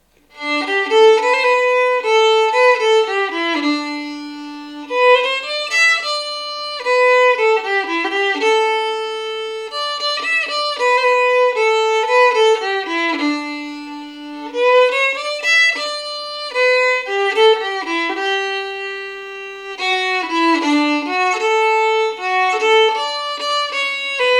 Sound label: waltz